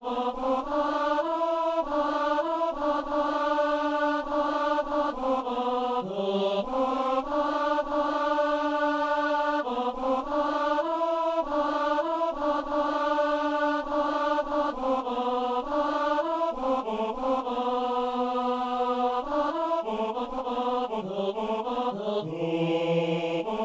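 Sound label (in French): ténor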